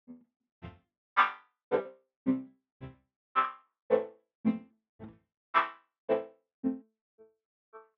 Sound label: BASS PATTERN; Moogerfooger MF-105S